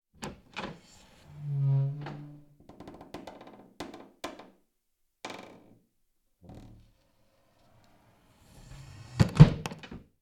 Wooden Door Open Close

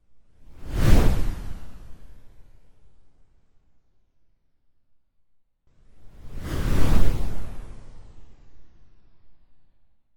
Passing Blows

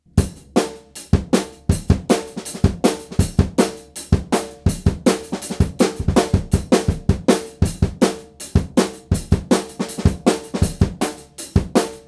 Drums Breakbeat

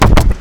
Pulse Hit

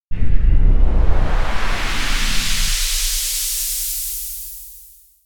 Large Surfacing Launching

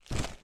Snow Step

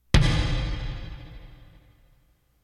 Drum reverb hit

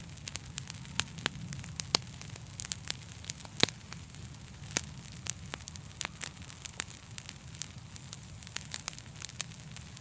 Fire From A Nettle

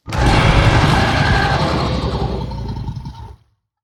Lizard Roaring Sound; Custom Insomiac Inspired

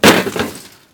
heavy smash

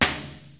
Magnet

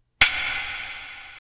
Kabuki Sound

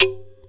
Djembe cheap mic session

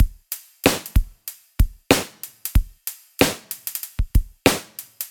Hip Hop Drum Loop

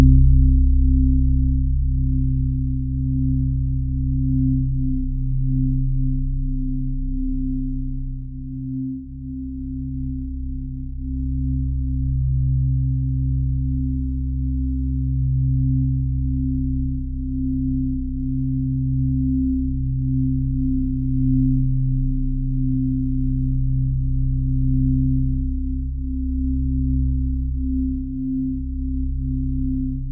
Bowl

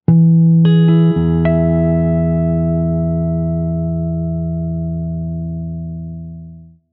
Harmonic Guitar Jingle

